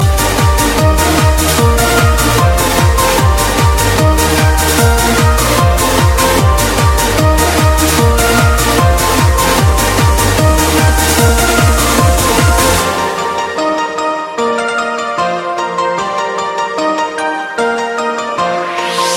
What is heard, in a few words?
Techno Rocker